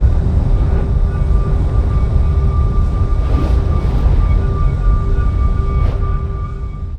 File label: Smoke; Seer; GamigoNA